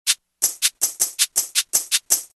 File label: drums